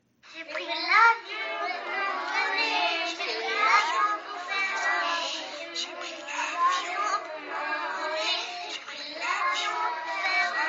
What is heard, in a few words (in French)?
chant